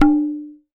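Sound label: TABLA left; WorldSounds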